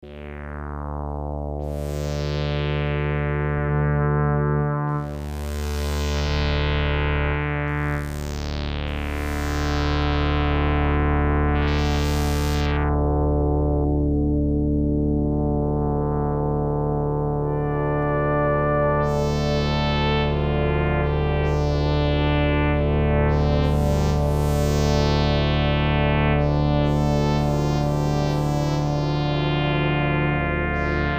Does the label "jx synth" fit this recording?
Yes